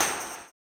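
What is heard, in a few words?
tambourine, 160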